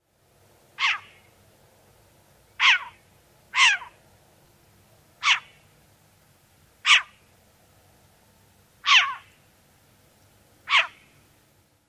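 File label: barks, Short-eared Owl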